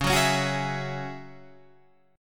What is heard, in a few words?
Gsharp 7th Suspended 4th Sharp 5th Csharp, Guitar